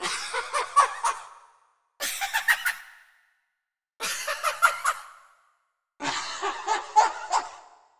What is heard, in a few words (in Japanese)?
waraigoe; musicbox